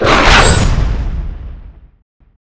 finger of death impact; weapons; dota; lion

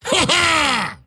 axe laugh; dota

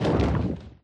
wings; barioth; mh event

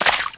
water step; footsteps

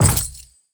chest drop; ui; inventory

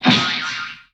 eject persona layer; weapons; dota; pudge

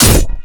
attack; dota; mars

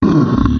golem layer; towers

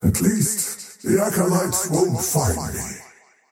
death; dota; faceless void

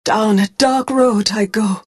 death; dota; phantom assassin

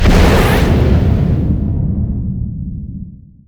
deafening blast; weapons; dota; invoker

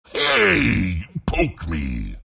pain; beastmaster

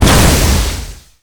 attack radiant, towers

